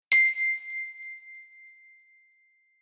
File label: Twinkle idea ding; positive